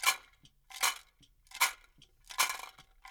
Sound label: RATTLE; Spectrasonics; Liquid Grooves 1 & 2; AF.PLANET